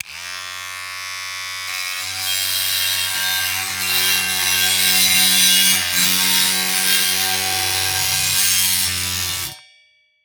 Industry Tool Grinder Electric Grinder Grinding Metal